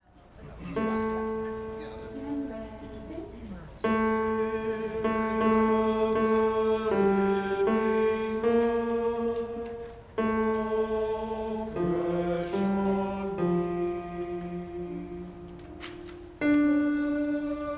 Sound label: bass